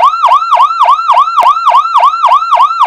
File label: yelp; gen 2; SHO-ME SIREN WITH REMOTE MINI-CONTROLLER; Siren Tones